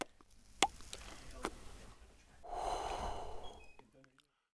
doutníček; Mafie 1

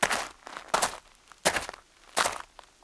kroky; Mafie 1

